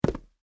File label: Boots Jump, Concrete; Footsteps; Footstep Sound Effects; Human